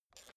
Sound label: suitcase latch lock spring creak